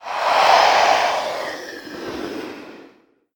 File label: attack